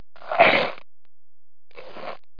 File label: crunch